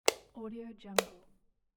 switch button

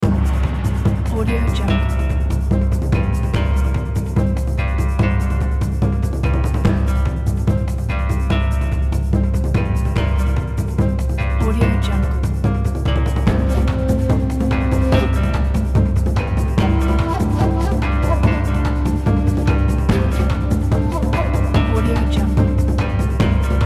chinese game loop

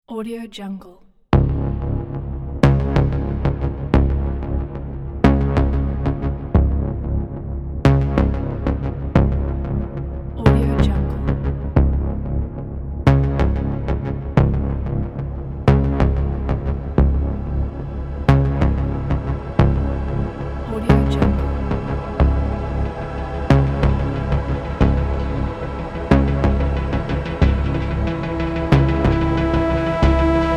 scifi landscape; frailautumnmusic

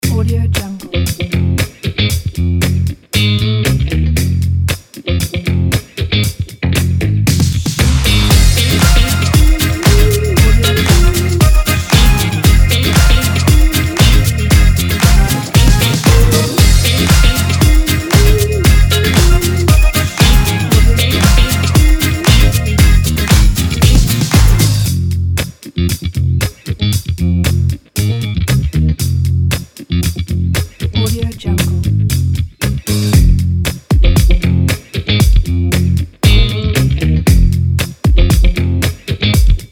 funk

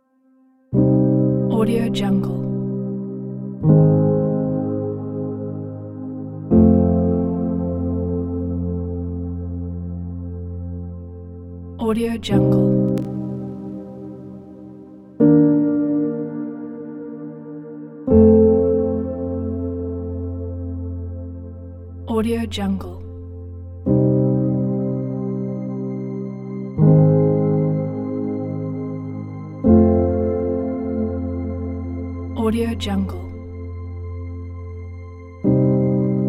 ambient documentary

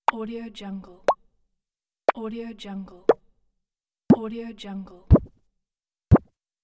cute popups; pluggedinrecords

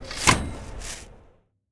settlement select government warlord hold sword; empire game; weapon

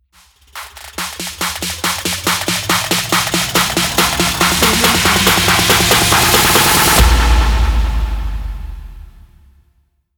drums uplifting riser

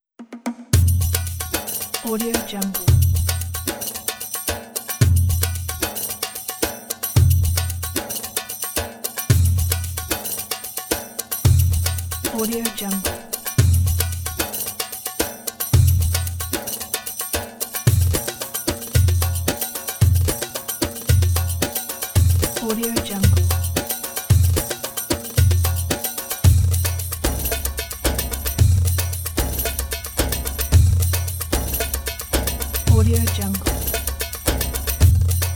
arabian drums style

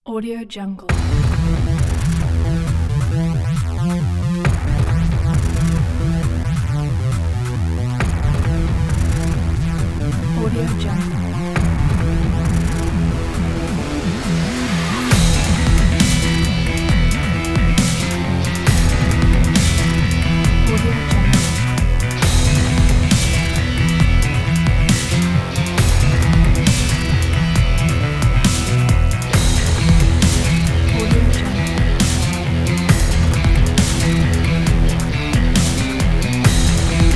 urban sport; rock-bulldog

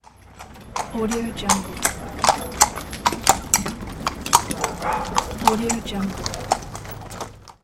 horse and carriage passing by; urbazon

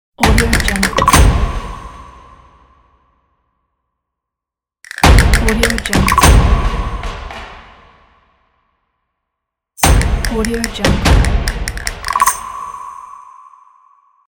tribal drum logo; rikkooymanaudio

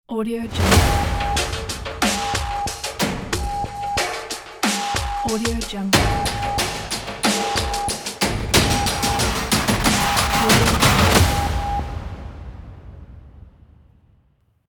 epic teaser synth logo

